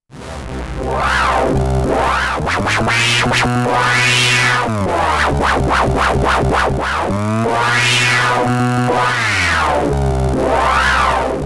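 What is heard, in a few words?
Anamono Xmini one Voice OSC2 SUB FM Self Sync; Gotharman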